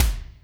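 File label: kick Lo Wet Wsp, Alesis D4; 300 Drum Machines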